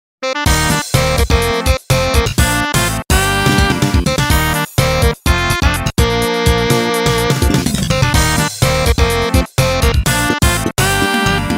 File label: jingle instrumental